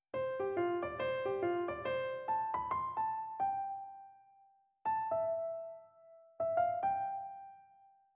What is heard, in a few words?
swung fanfare; how it originally sounds; victory fanfare